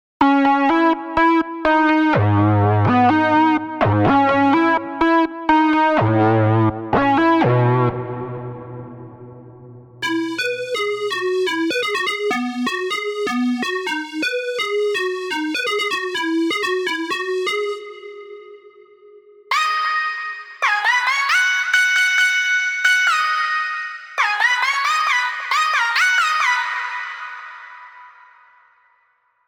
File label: Leads Pop & Future Pop; Unison; MIDI Wizard